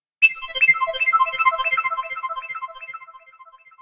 Flying, ringtones; Asus Padfone-2

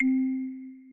Class Electronic, notifications; OnePlus 11

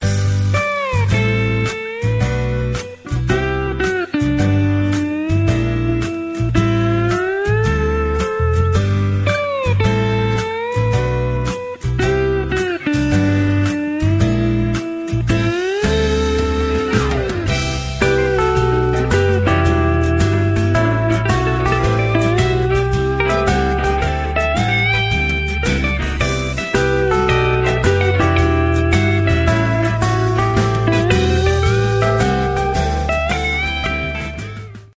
Ring Surf the Groove; LG T300 Cookie Lite

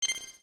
Arctic leave application grid; Audio themes

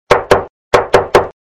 Message, phonetones; KS10

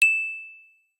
Twinkle, phonetones notifications; Optimus L3 E400

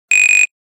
Buzzer, Nokia; Nokia E90

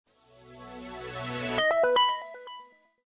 Power Up

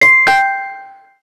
mms bell; Samsung SCH-M710 T Omnia II

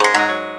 gayageum, keytone; SKY IM-A730S-VEGA-S

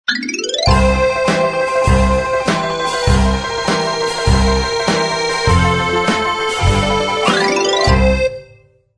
Ringtone

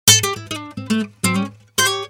Acc Guit; PlusFourSix

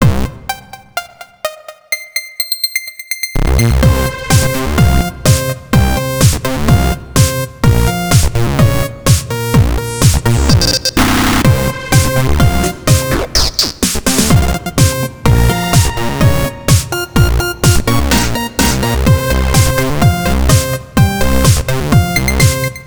Thumper; Sony Ericsson; PlusFourSix; Patti (W902)

